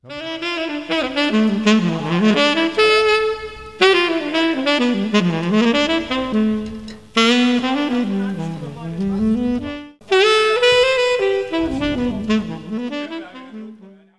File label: Sax Interlude